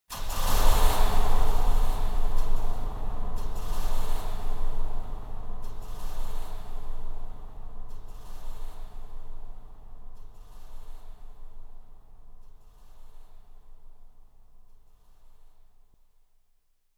Impacts Distant Multi Hits Breaths Finale Hit - Echo; Hollywood Edge; sound designer's toolkit v2